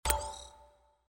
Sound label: purchase